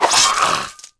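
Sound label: sword death; AoM-ARTofMyth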